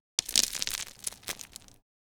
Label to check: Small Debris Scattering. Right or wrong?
Right